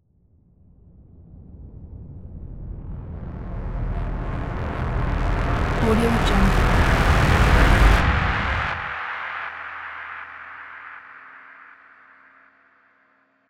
tension whoosh

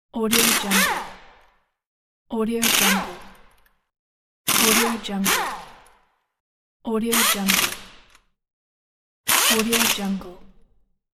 pneumatic tool

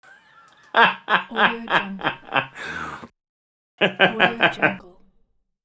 male laughter

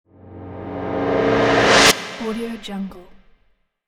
metallic whoosh effect

